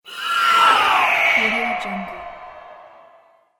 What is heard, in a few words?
wraith ghost pass; fxprosound